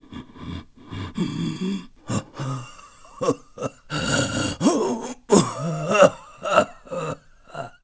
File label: Dying; Ganondorf